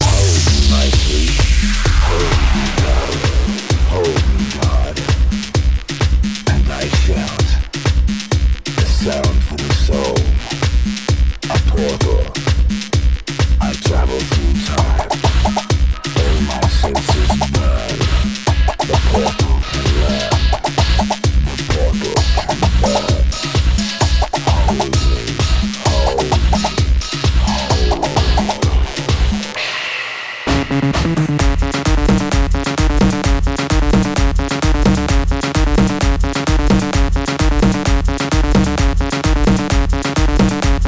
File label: 130 BPM